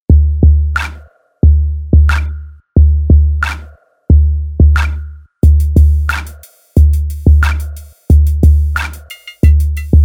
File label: Analogue workout